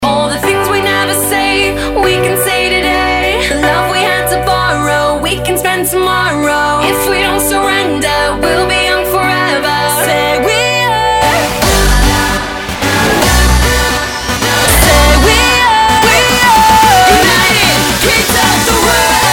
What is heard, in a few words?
hardstyle